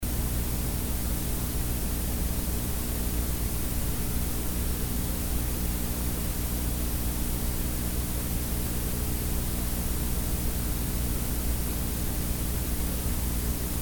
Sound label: tape hiss